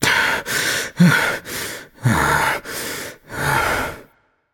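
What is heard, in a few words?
nonverbal breath; voicepack; smite; merlin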